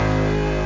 SWITCH; Explorapedia; ComputerRoom

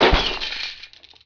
fall; BESTSHOT; noisybit

